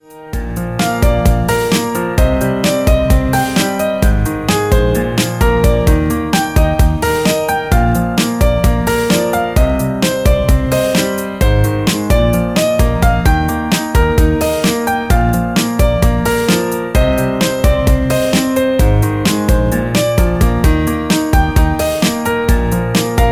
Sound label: Happy Electro Beat; MIUI14 and HyperOS 1.0